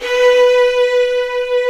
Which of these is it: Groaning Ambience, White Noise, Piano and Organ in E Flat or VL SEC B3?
VL SEC B3